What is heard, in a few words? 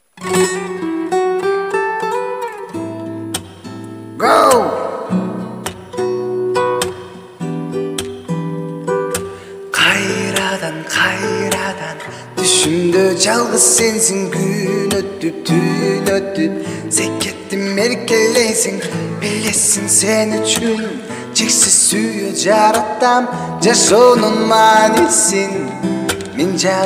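guitar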